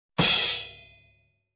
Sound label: Kick and Crash ending hit